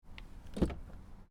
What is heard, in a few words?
car door open external; spoteffects